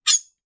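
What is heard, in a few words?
sounds of scratches on metal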